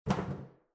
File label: Metal Footfall; soundscrate